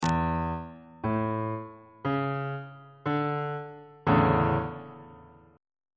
c double sharp major 7th suspended 2nd 1st inversion on bass clef